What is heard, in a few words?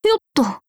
jump